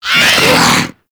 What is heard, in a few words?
Infested Ancient Grapple Vocal, Enemies; Warframe